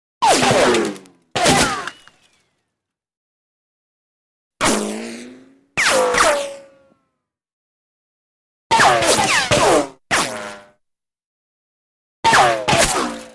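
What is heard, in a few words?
series of shots with ricochet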